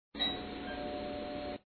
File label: SFX Cash Register; Bin Laden Liquors